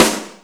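dirty snare drum sample a key, tuned to the A note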